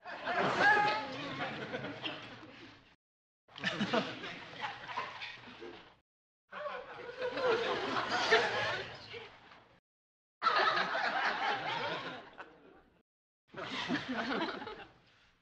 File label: Crowd Lt Burst, small int